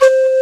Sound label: bottle blow; Greytsounds; Scott Peers Sound Engineering